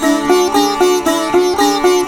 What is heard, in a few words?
SITAR, Indian Traditions